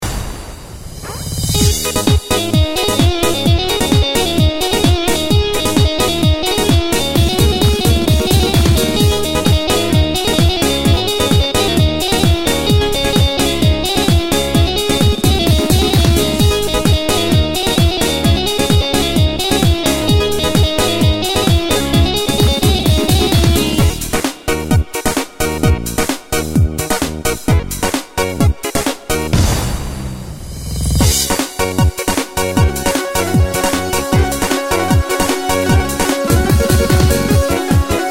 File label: karadeniz pop